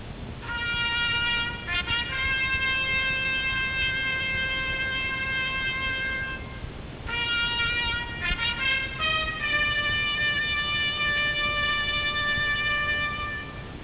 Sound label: fanfare